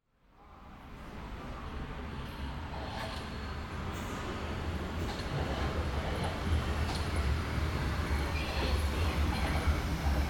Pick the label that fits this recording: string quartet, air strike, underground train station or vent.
underground train station